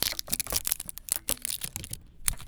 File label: Crinkle Plastic; BB Retail Therapy Sample Pack